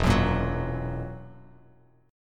E Suspended 2nd Flat 5th E down Bass Standard; strummed; JGuitar

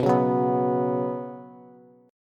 B Suspended 2nd B down Bass, Bsus2 chord

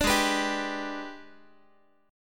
G sharp Minor Major 7th Flat 5th, Guitar; G#mM7b5 strummed; 17,16,14,17,x,x

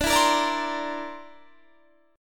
D 7th Suspended 2nd Sharp 5th D

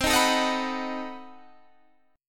B 7th C; B7/C; strummed

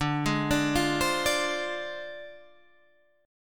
Ab 6th Flat 5th D

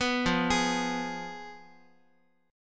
F Diminished Guitar; Fdim arpeggiated; JGuitar

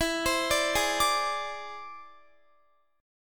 E Augmented 7th E down Guitar; E+7; arpeggiated

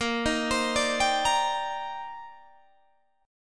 C Minor 9th Bb, Guitar Standard; Cm9/Bb arpeggiated; JGuitar

